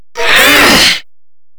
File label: Exertion; Enemies; Vocals; Warframe; Grineer Hammer Boss